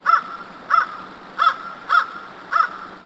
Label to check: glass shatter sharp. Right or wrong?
Wrong